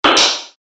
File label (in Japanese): スイッチ; モノラル; ＲＰＧゲームのダンジョンやフィールド